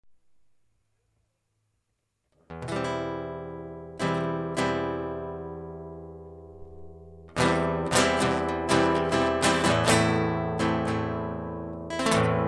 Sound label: Guitar